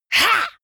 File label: female attack